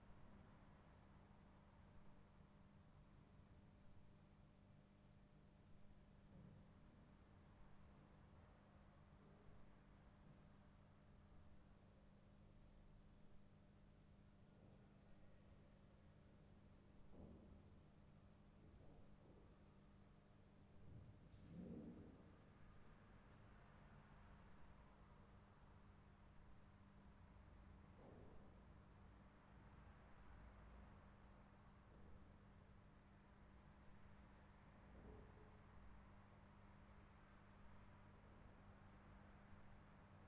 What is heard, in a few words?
Room Tone Flat